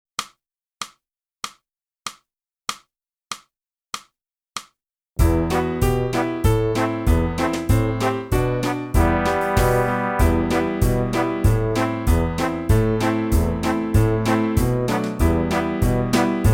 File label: flute; boosey